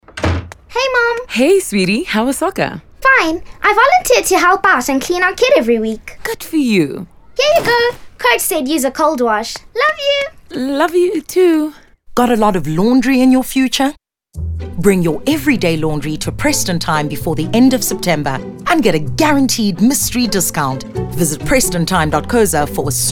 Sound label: Music